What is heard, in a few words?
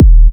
Minimal Kick, Drums, Kicks